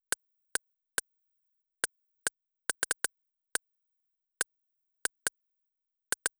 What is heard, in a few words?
drum Loop 140BPM Perc; Vengeance - Essential Clubsounds Vol.5; VEC5 Drumloops; VEC5 Multi Loops 140BPM